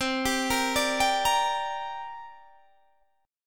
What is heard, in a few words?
C 7th Suspended 2nd C down Guitar Open D